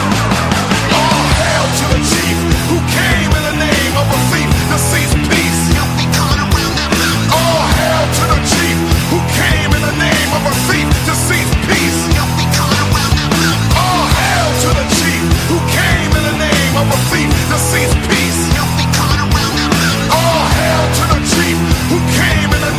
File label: music; la resistencia juego